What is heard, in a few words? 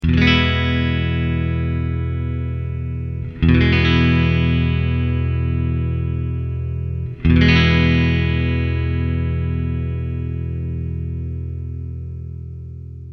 middle clean; axetec